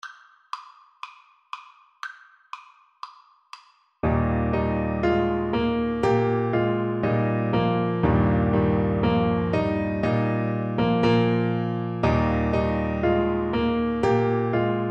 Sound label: Baritone Saxophone